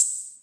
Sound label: hho, Roland TR-66; drum machines